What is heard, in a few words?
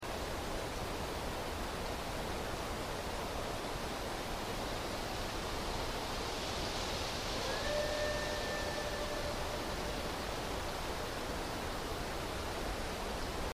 unknown vocal location